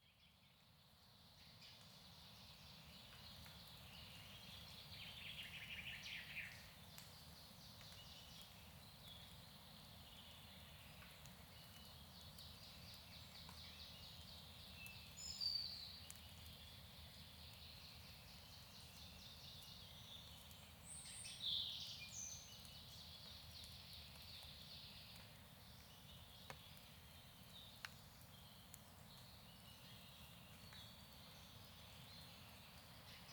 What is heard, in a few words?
birds woodland binaural; Lagoa Sao Bras